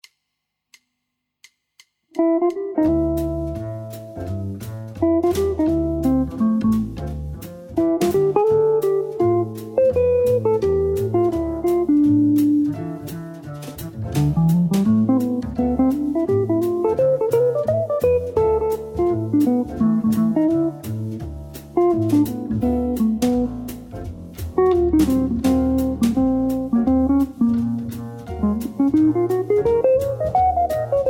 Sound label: solo, jazz guitar